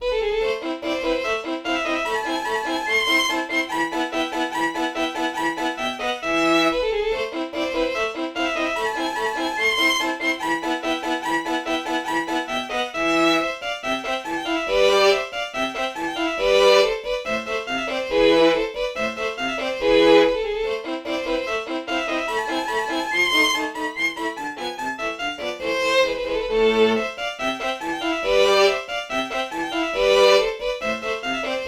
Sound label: violin